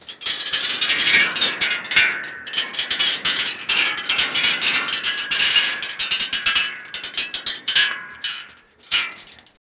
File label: wet tentacles drag across